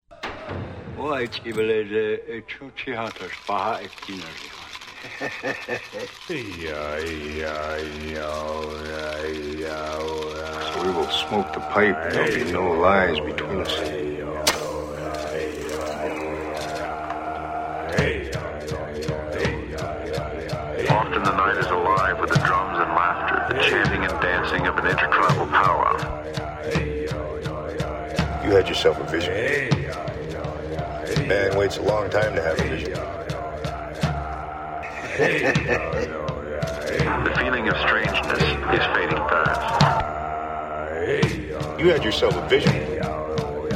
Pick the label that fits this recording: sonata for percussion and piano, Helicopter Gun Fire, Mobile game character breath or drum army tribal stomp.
drum army tribal stomp